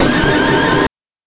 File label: BANK2 SFX, NES DPCM; MIDINES